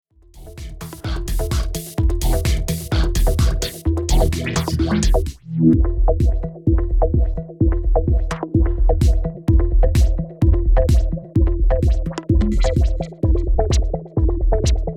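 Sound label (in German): Denon DJ X1800 Filter Frequenz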